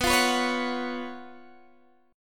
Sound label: Db 11th B, guitar